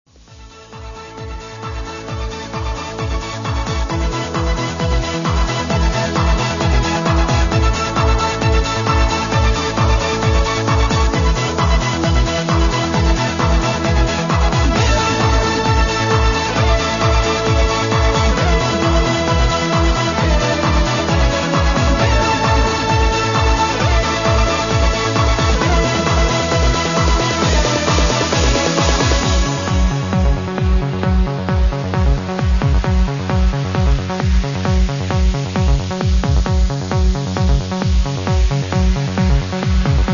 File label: morgenrot trance mix